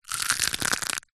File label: egg shell squeeze crack hand; food